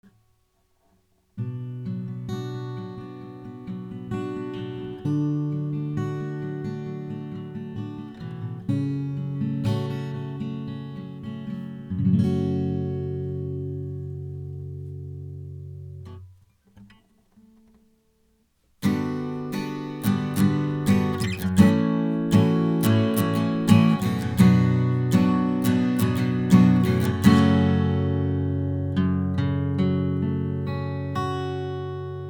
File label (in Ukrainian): mic test gitara; UAD Volt Mic; Arturia MiniFuse Recording Pack – аудіоінтерфейс, мікрофон та навушники в одному комплекті; UAD Volt Mic - Тест Гитара